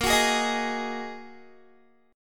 Bb 7th Suspended 4th Sharp 5th, Guitar; Bb7sus4#5 strummed; 18,21,18,20,20,x

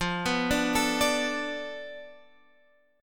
B-Minor 7th F; Bm7/F arpeggiated

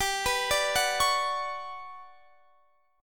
G Major Sharp 11th, Guitar, Drop D; chordshape; down

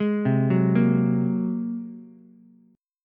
Bb 7th Suspended 2nd Sharp 5th Bb Ukulele, chord; Bb7sus2#5 arpeggiated; JGuitar

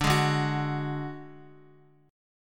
D Major Flat 5th D Guitar, chord; strummed; JGuitar

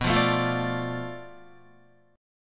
B-Minor 7th Flat 5th B down Guitar; Bm7b5 strummed

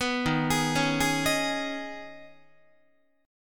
D sharp 7th Suspended 4th Sharp 5th, Guitar; D#7sus4#5; arpeggiated

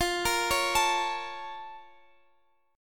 Bb Minor Major 7th

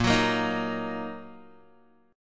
Bb Suspended 4th Sharp 5th Bb down Guitar; Bbsus4#5 strummed

